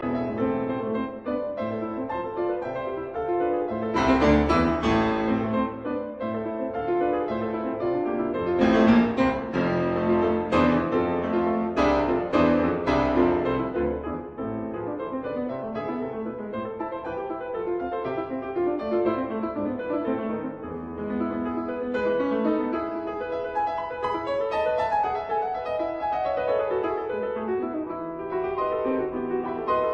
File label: Concert Allegro in A major; Scarlatti